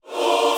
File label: choir